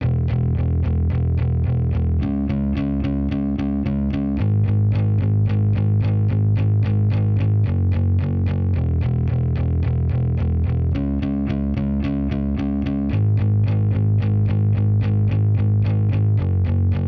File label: bass, 110 BPM G# Min; Stems; Cymatics; Cymatics - CHAOS Beta Pack (MIDI + Stems); Dead Inside